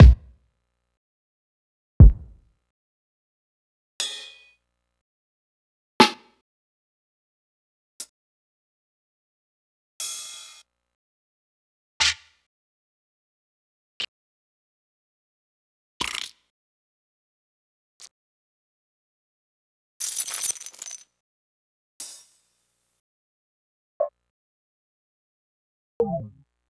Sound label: DnB Drums